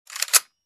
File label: Reloading machine gun